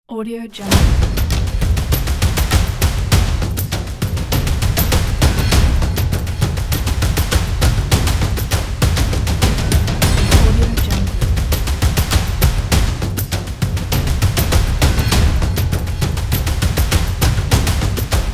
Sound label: ethnic epics percussion